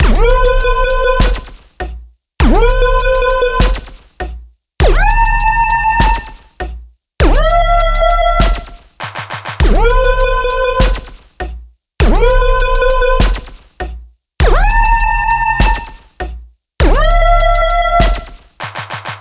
Dubstep Beat

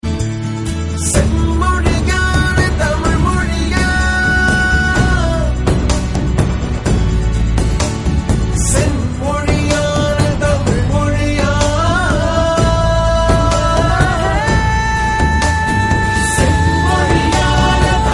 Tamil Anthem

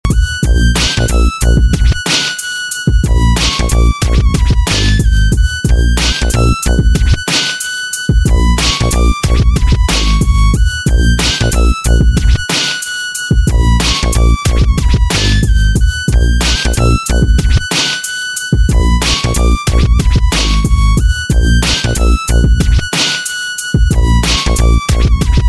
G Funk

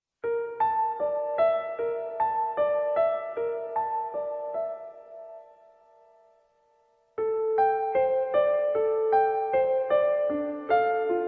Piano

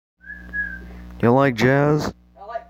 Jazz

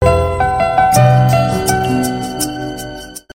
Best Short Sms

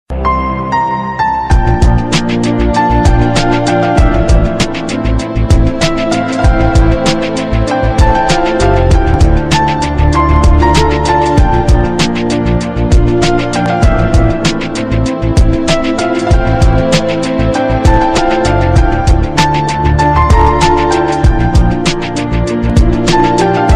Love Tune

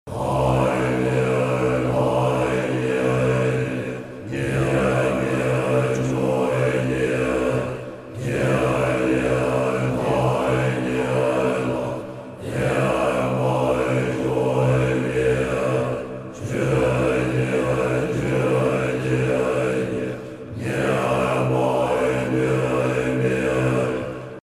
Tibetan Chanting

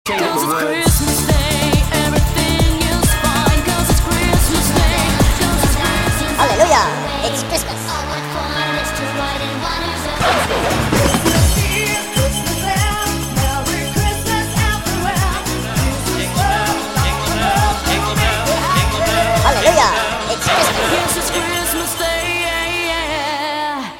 Xmas Jingel Rimix

